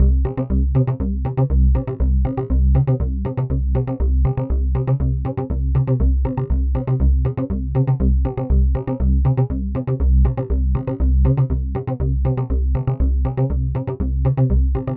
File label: Bass flanger